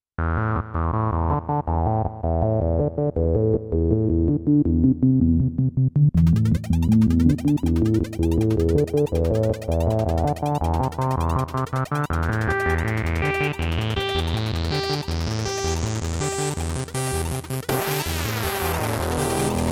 MS2000B Experimen techno

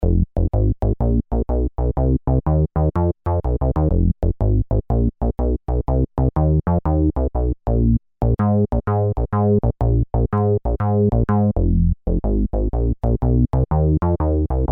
hollow bass

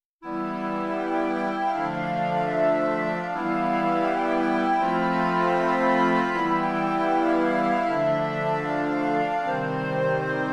Ens Woodwinds

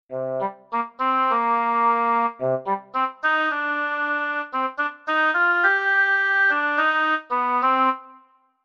Oboe

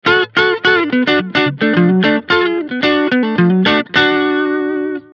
Classic Country

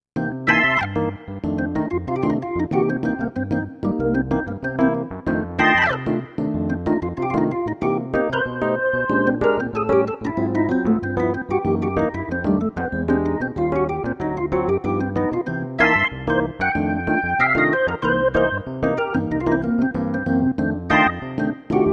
Jazz Organ

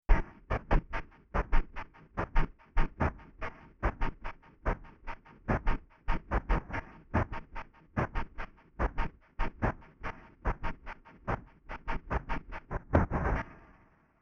Choo Choo

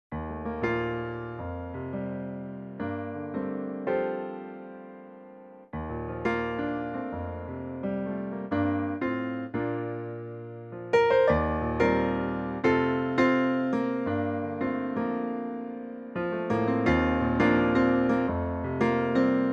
Ac Grand

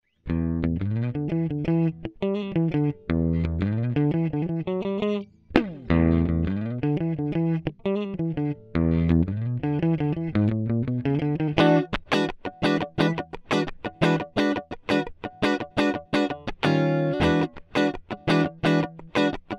Electric Guitar

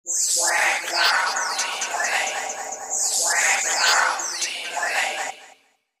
hall of ghosts effect

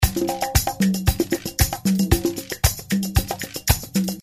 Percussion Groove

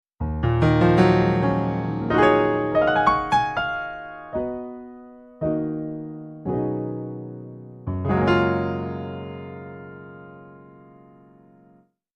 Grand Piano

